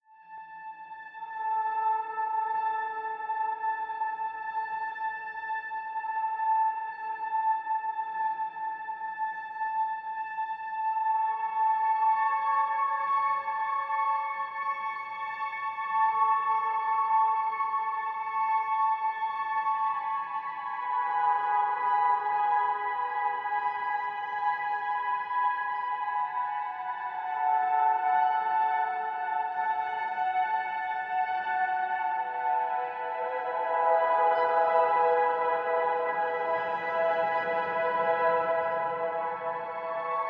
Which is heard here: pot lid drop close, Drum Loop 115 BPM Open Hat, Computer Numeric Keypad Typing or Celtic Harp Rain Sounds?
Celtic Harp Rain Sounds